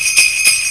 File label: printer